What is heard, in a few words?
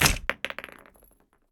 Monster; Zenless Zone Zero